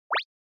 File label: Anime Motion Single